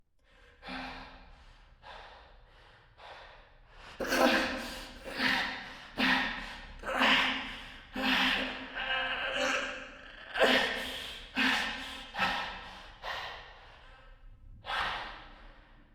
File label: Vision Vocalizations Female; Bromius; Synod; Envoy